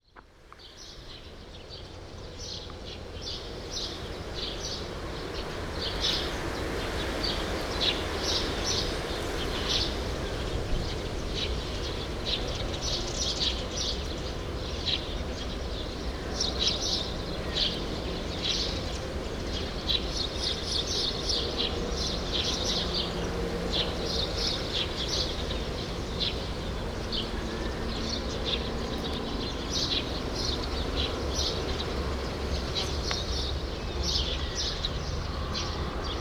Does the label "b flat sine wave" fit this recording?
No